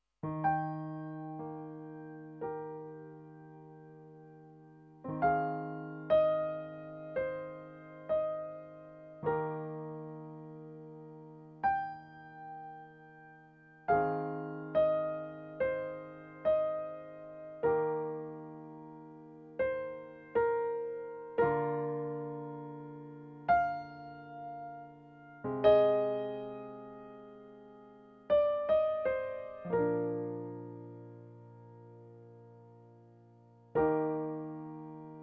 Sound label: Piano